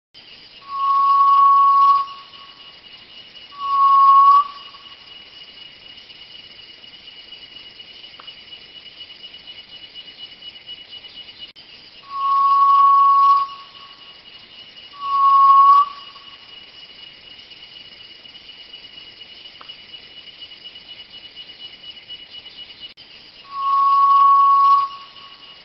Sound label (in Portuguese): Canto da inhambu galinha